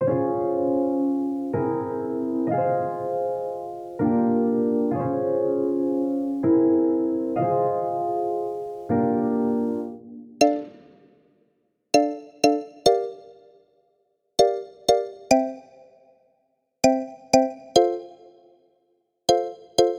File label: Dancehall Afrobeats